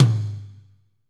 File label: tom, Pop Country; Northstar; Drumscapes Roland